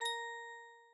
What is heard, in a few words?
complete; sound-theme-freedesktop